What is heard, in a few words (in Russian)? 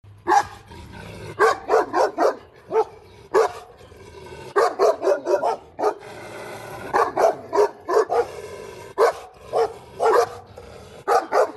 zlobnyi lai psa